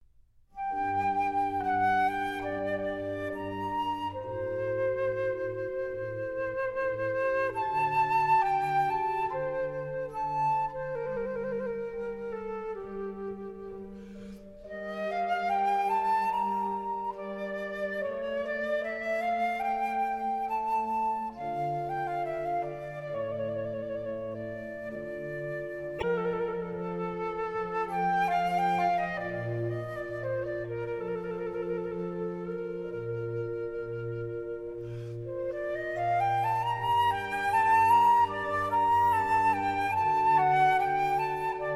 Concerto for Flute Violin Organ Strings and Basso continuo in D minor; RV 541